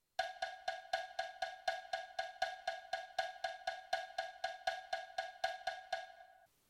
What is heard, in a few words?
3 notes accent